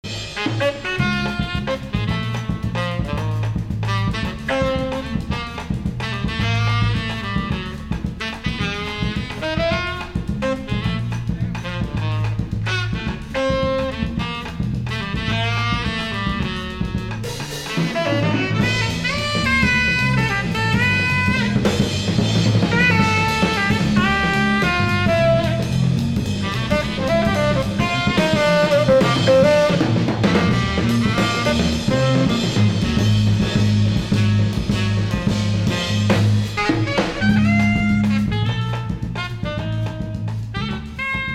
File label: Bossa